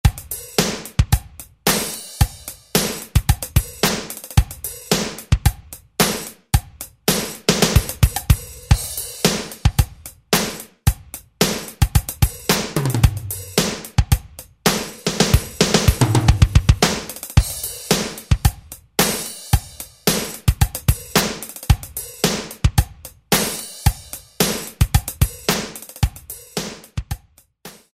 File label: Funk, Alesis SR-16; Preset Patterns